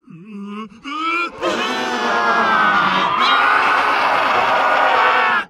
rage start; csgo; eternity; scp; 096

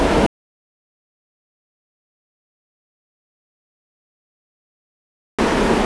heavy wind, ambient

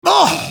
engineer pain sharp, hurt; hl2dm

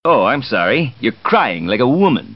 crying woman

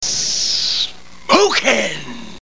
join server; dod

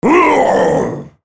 spell cast effort; freak fortress 2; volibear

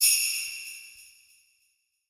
tree idle

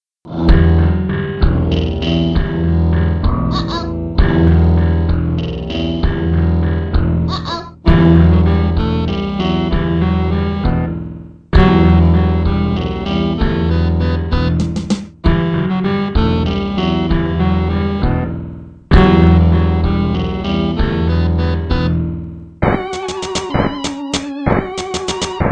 random music; css

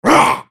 effort; freak fortress 2; volibear

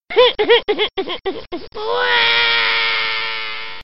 cry

